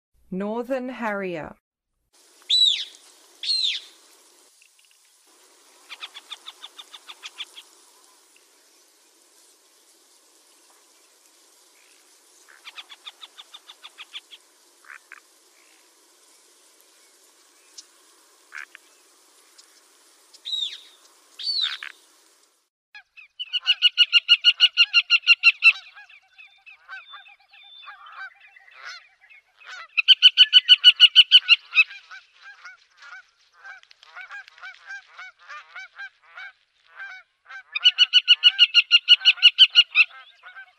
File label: Northern Harrier, Songs of Birds of North America